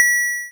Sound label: pickup, 8-Bit Sounds